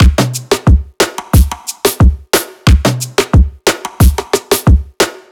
90 BPM Full Drum Loop

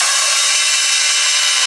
open hihat

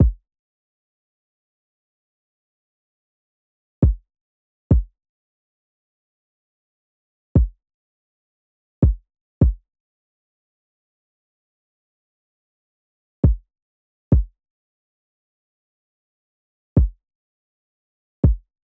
102 F kick

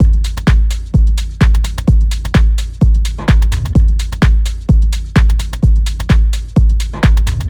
Beat Loop 128bpm

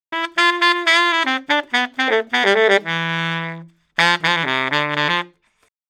SAX RIFFS BARITONE 124 Dm

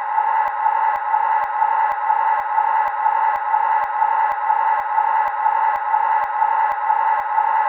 Fx 125BPM

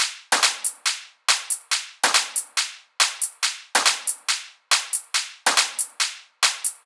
top loop 140

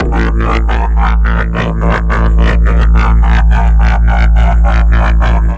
FM Bass A0

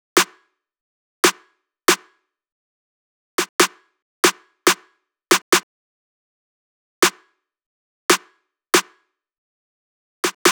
140bpm Eb min Snare

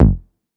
Perc Shot

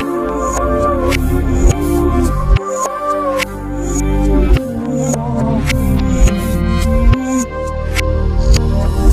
BPM 105

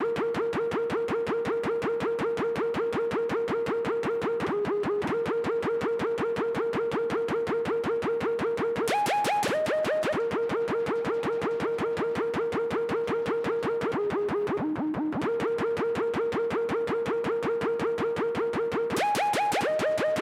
95 A Whirly Synth

